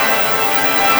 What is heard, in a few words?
fm rhodes ambiance loop